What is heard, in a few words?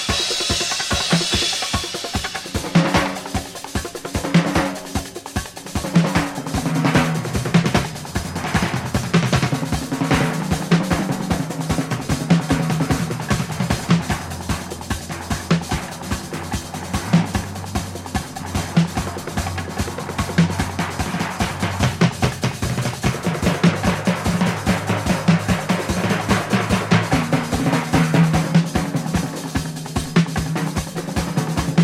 percussion fast action; percussion spectrum